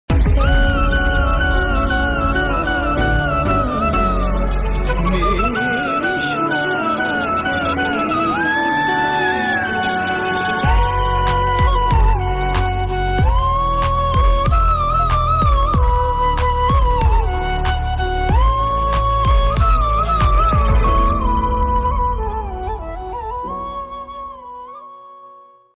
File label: romantic bgm; Kanabadutaledu; sukranth; vaishaliraj